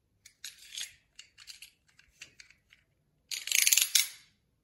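zvuk vysun zasun lezvie, sound of blade sliding out and in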